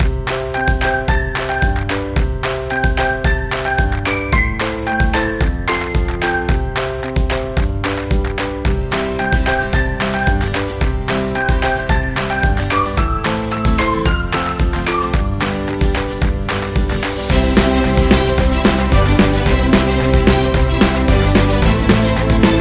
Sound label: Birthday Music